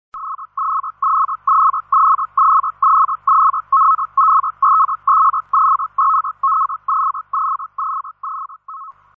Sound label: mode AMTOR ARQ short